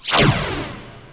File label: Zap